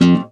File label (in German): KONZERTGIZ, Alpine Volksmusik